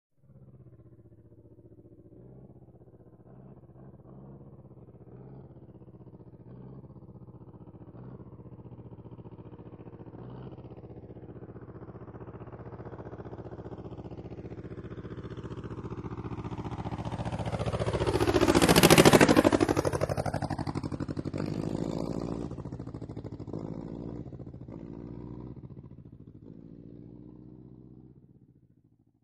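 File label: Taylorcraft By Throttle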